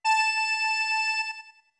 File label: Music Box A long; OOT